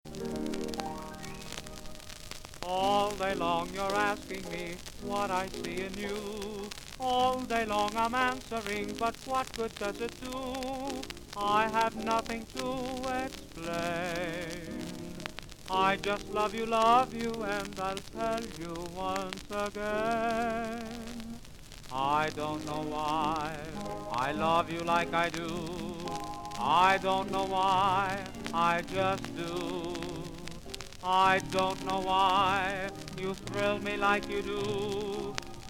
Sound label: vocal; Popular Jazz Archive 1920-1936